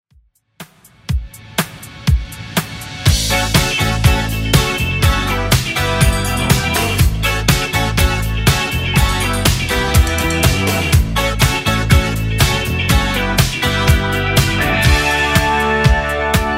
80s Redrum 122bpm

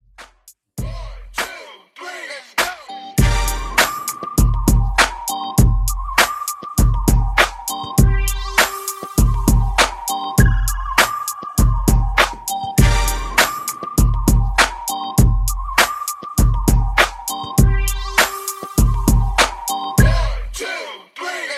Break In Outro Mixshow Extended, 100 BPM

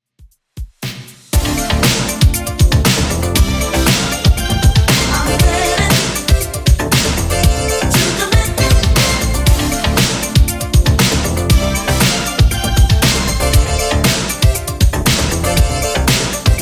80s Redrum 118 bpm; remixplanet

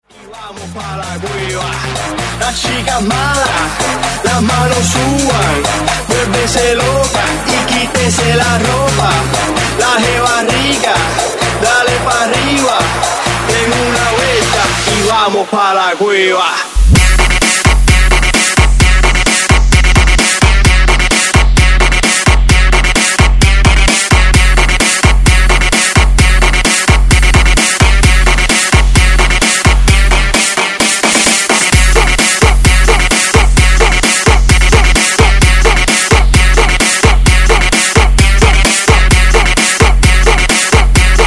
130Bpm BREAKMIX

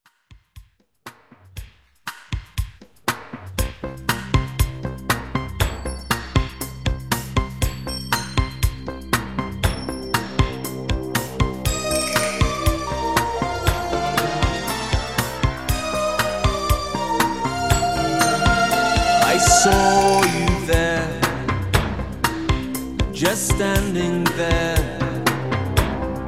Dj Intro Outro, 119Bpm

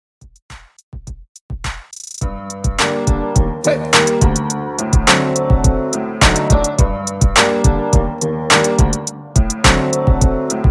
Redrum 105 Bpm

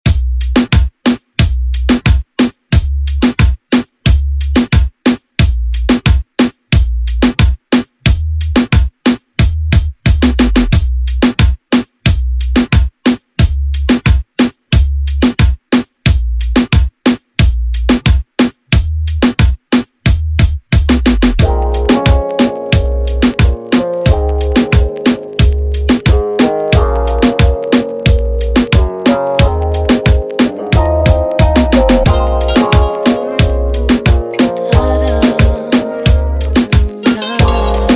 Reggaeton Redrum

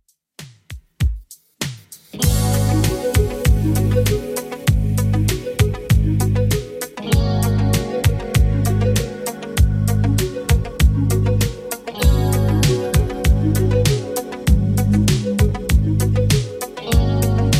90s Redrum 98 bpm

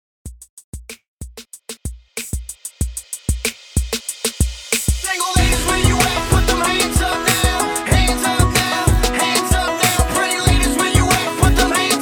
94 BPM

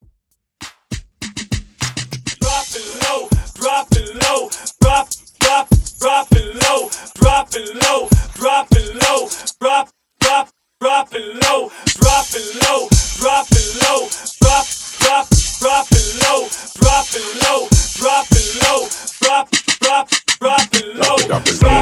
Hip Hop Party Breakz; Dirty Intro; 100 BPM

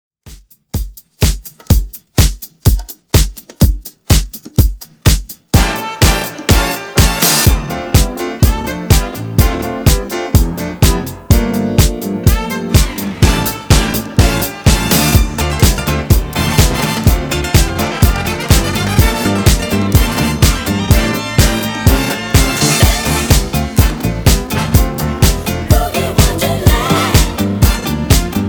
125bpm

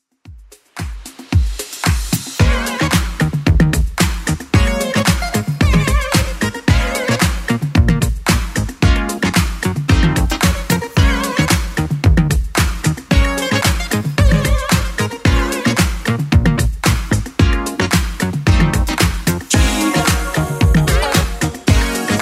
80s Club Edit 112bpm

